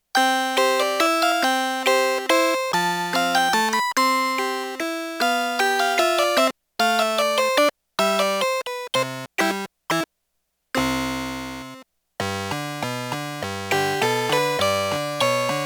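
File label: Harpsichord, Roland JX-8P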